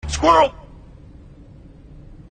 squirrel

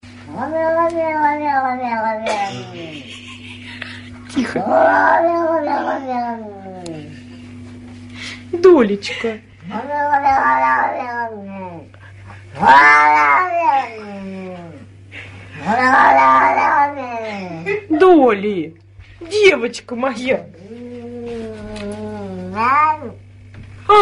demon cat olha olha olha

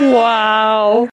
rock skipping; mlg

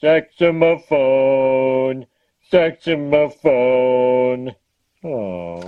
saxamaphone

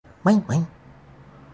puke

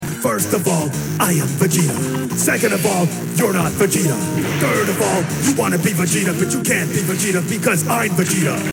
beatbox

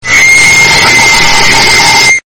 screaming on the rollercoaster

